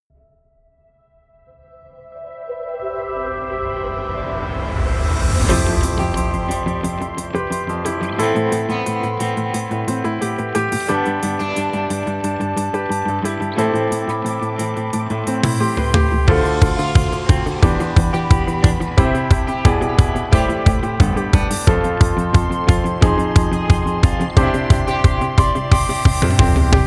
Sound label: inst bpm178